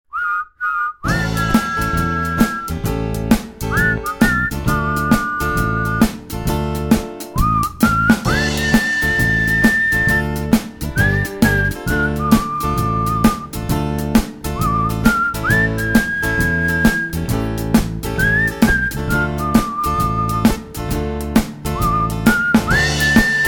zonder zang

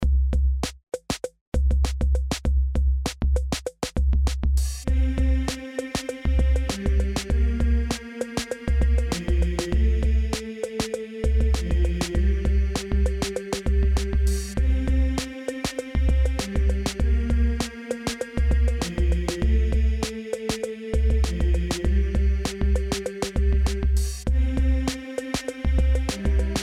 zonder zang

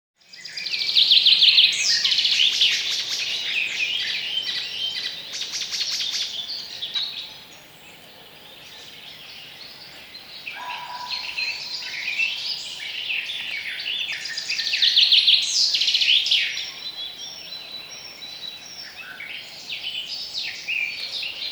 omgevingsgeluid

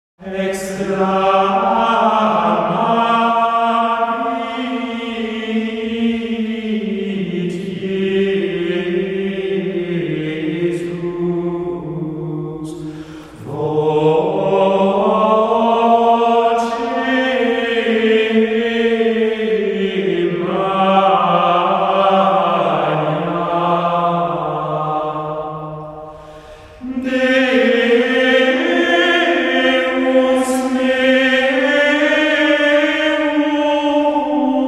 gregoriaans gezang

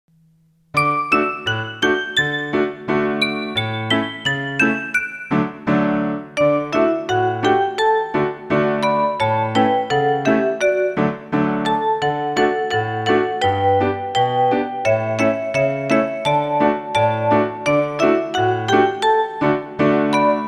zonder zang

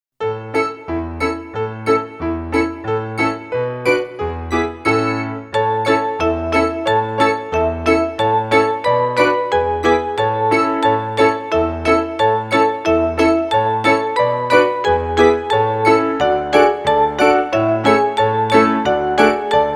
zonder zang

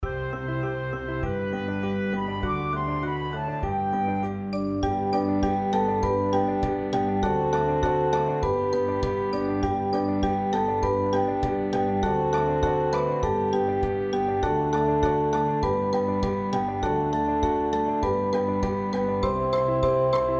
zonder zang

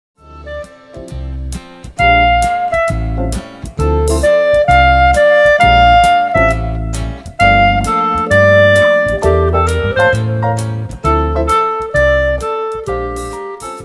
jazzmuziek

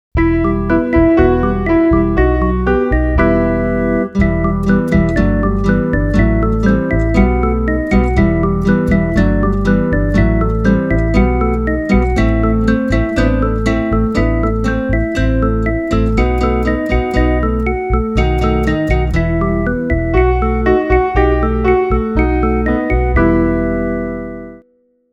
kanonskogel zonder zang

